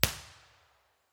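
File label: Forest impulse response, Wheldrake wood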